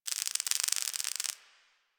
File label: Night Insect Click; Ambience; One Offs; Insects; Warframe; Quests; The New War; Drifter Camp